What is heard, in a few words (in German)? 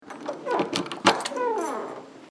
Tuer oeffnen leichtes Quitsch